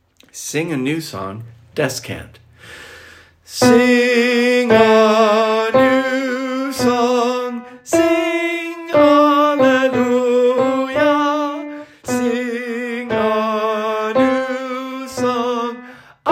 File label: Sing a new song descant, Descant (Tenor); St. Anthony of Padua - Choir Sunday